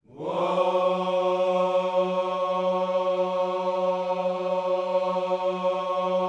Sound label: WHOA F#3, Vocal Planet, Gospel; ILIO